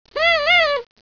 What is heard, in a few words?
PARTY